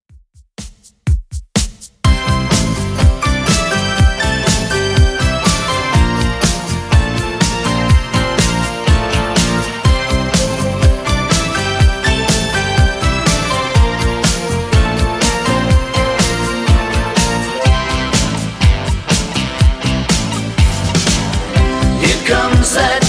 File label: 70s Redrum 123bpm